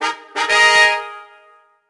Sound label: Collect Coin; shrek forever after; nds